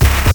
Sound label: glitch; foxdot default